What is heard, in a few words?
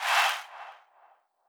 Chants